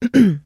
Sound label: trader clear throat; 商人杰恩（七日杀）